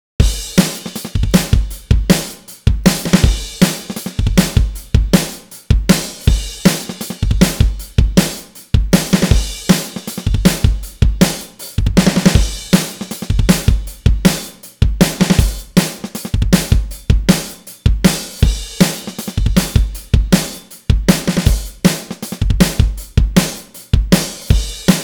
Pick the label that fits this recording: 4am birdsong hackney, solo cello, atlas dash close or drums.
drums